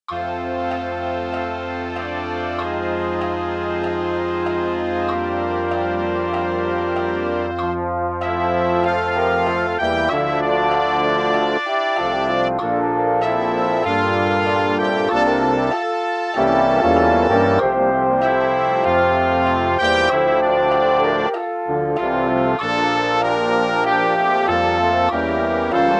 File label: score, MIDI; Full Score